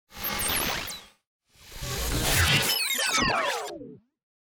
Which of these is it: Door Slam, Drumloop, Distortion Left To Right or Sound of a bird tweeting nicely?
Distortion Left To Right